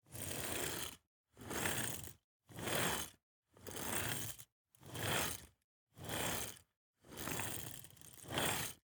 Roller skates, old fashioned, all metal, wooden wheels, swipes; 2 mics; Eiravaein Works; Vaeyan IV